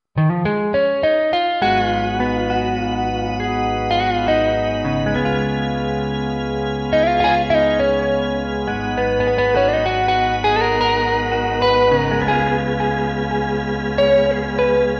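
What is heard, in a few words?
Jazz Guitar Rotary Organ; MidiGuitar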